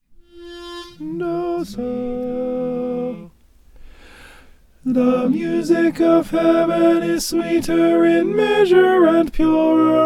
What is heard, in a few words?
the music of heaven alto